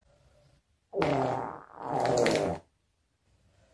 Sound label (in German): Quetschfurz